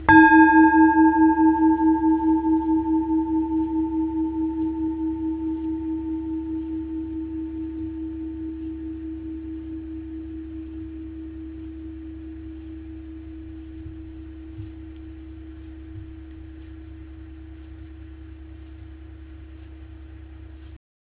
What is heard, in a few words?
Bol Tibetan